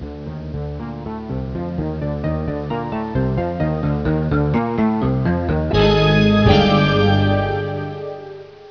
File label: jaws